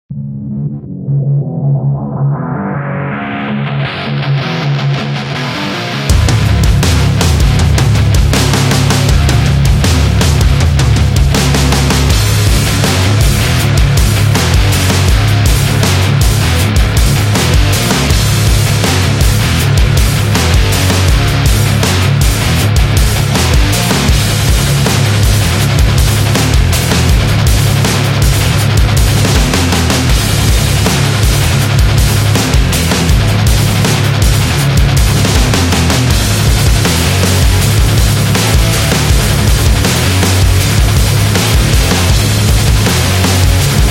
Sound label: This Metallic, metal